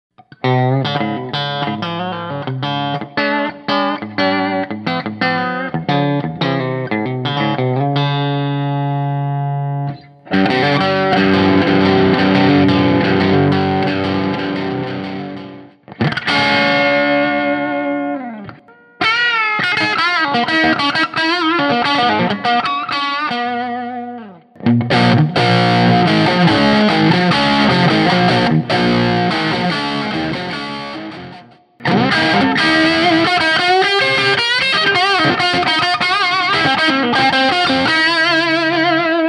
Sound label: Baby Vox Tele, gibson tube amp; made usa